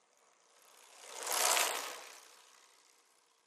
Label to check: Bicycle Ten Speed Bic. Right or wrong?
Right